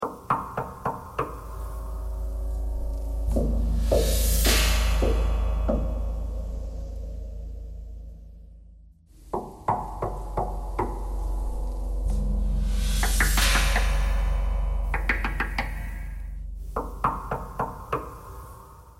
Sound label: knocking thread noise